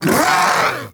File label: Vocal Pain; Hunting Critters; Vulpaphyla